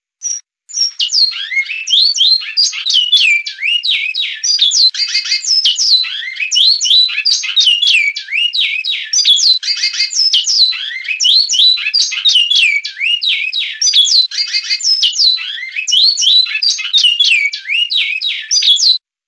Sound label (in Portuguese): canto bicudo